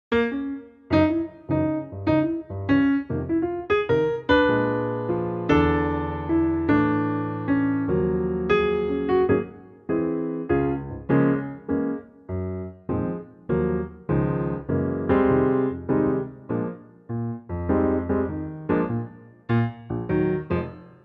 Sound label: Db piano